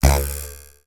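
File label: cartoon plastic twang; zapsplat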